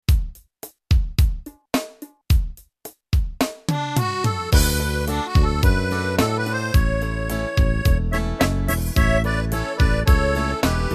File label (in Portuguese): ritmos yamaha toada